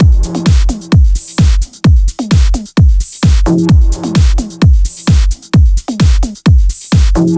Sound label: 130BPM Kit; VFH1 130BPM Tibet Kit